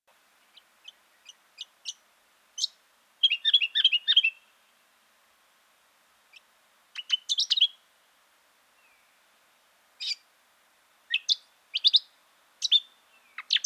brown honeyeater; Australian

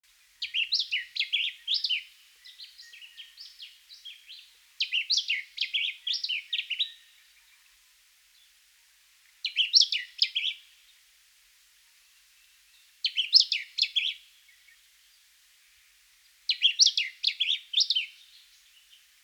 lemon bellied flycatcher

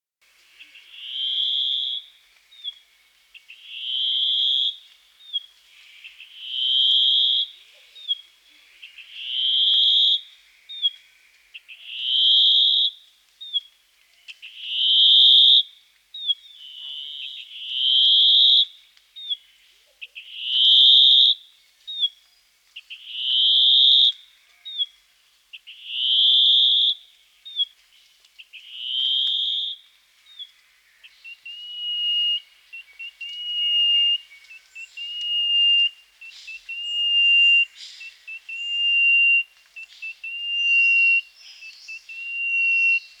fernwren

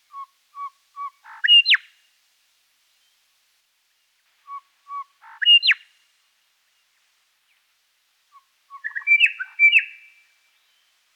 grey shrike thrush

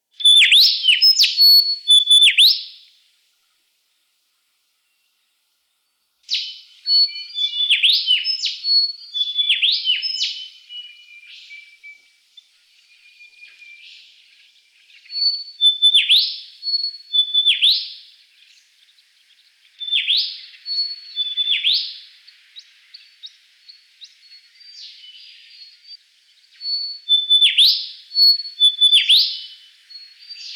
pilotbird

